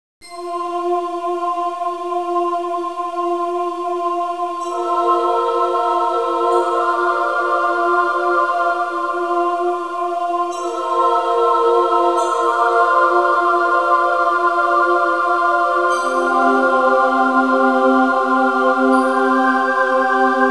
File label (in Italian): audio suonerie musica mistica